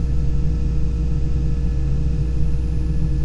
armory ambience; Quake 4 Alpha; id software

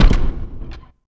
step; Quake 4 Alpha; strogg tik

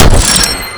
cgun shot

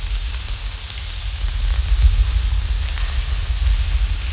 cryo loop verb; Quake 4 Alpha; ambience cryo

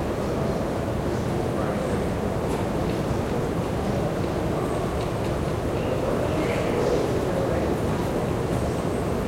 mess hall ambience; Quake 4 Alpha; id software

